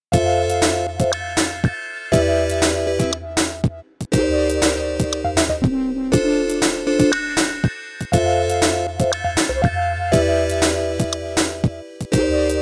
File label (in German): Elektron Model Samples nur drei Tracks; kreaktiv